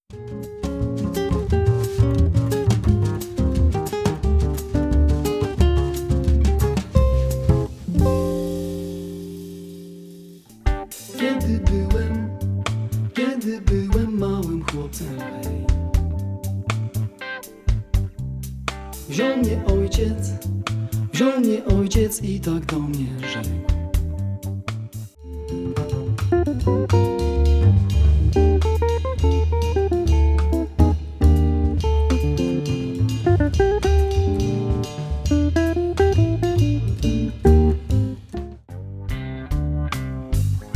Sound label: Jazz